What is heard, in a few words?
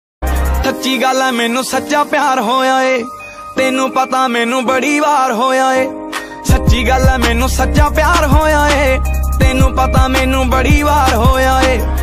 ringtone